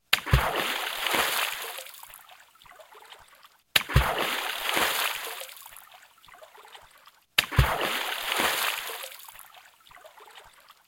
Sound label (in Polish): Plusk wody